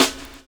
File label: LAUGH; Univers Sons